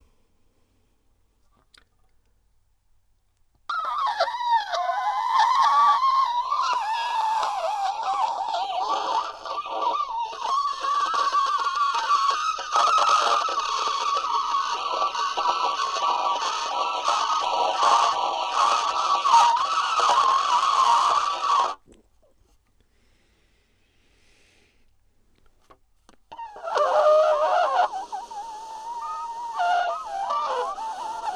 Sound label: bird